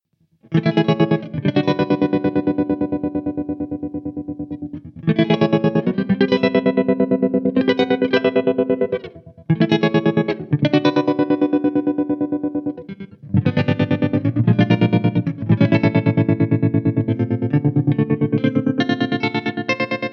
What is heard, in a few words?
perc short